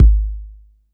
C2 BD Analog H, Yamaha PSR-E323

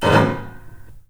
SCRAPE, The Piano; InVision Interactive

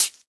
Hhat, Hihat; Equipped Music; Loungin House

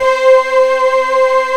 PAD, SYN PAD; AKAI S6000 CD-ROM - Volume 1; SAMPLE WAVE MIXING 1

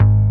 bass House; Best Service Gigapack 2

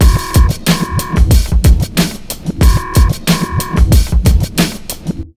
92 BEAT, Pure Electro, BEAT MIX

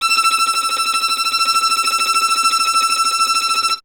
STR VLN, Vln Trem; Solo Instruments; Miroslav Vitous

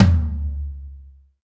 tom lo, Alesis DM5

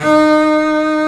Bass Leg G Eb4, Virtuoso Samples; Digital Sound Factory Virtuoso

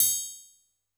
A5 Triangle Open, Yamaha PSR-E323 Standard Kit

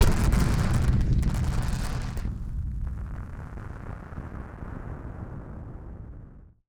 Firework FX; musicradar; bomb factory

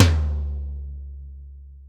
TOM-TOM-L, ACOUSTIC KIT; Greytsounds VOL-1

